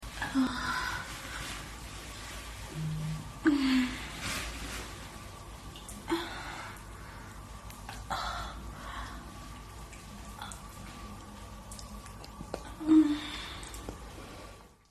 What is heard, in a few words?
moan